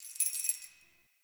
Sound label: Keys Jingle